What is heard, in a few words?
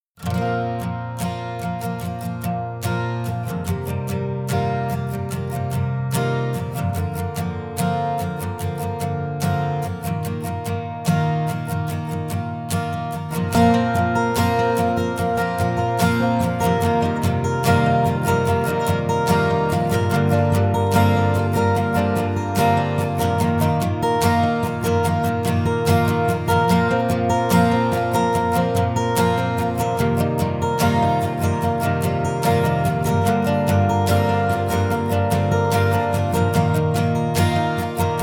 Matrix Blend mic